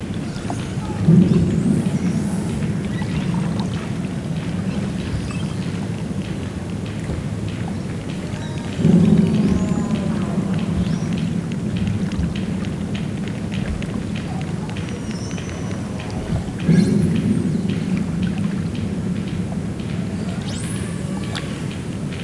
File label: short seismic